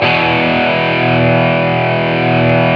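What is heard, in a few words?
GTR POWER, Power Chords; Club-50 - Foundations Roland; Metal Chds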